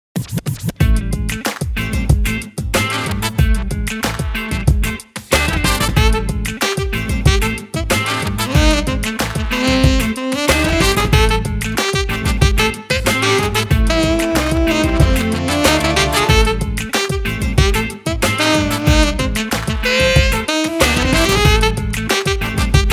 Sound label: Trumpet, Electric Funk